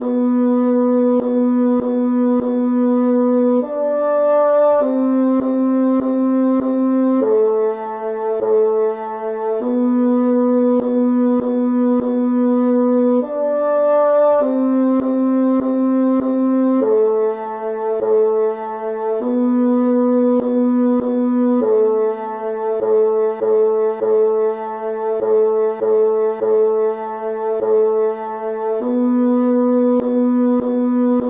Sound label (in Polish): tenor witaj pokarmie